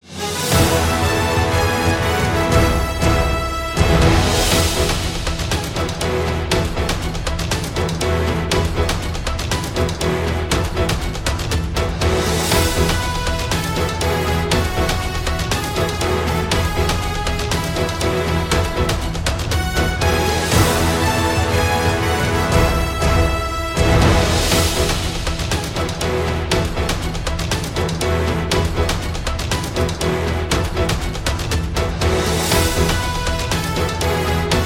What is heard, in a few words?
battle fanfare hard multi; worldflipper